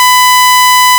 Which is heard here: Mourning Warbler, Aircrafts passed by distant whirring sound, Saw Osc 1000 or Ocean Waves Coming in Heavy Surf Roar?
Saw Osc 1000